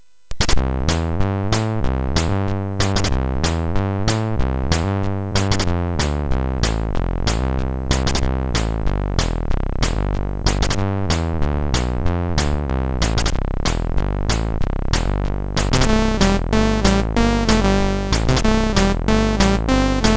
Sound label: sid MOS6581R3